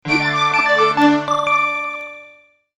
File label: Download Receive, notifications; Coolpad; Rogue